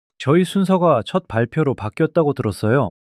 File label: surprise